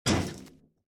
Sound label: grate step; Golden Crayon Sounds